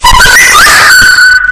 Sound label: bow hit; LumiCu 2.0; minecraft